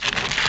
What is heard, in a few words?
grind